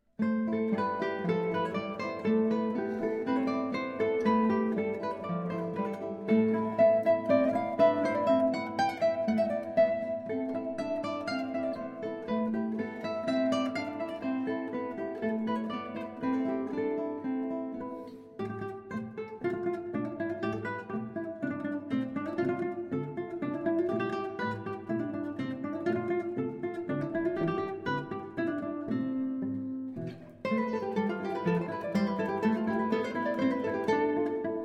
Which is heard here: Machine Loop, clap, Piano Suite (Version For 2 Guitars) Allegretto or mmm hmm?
Piano Suite (Version For 2 Guitars) Allegretto